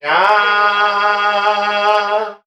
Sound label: "yah", Voice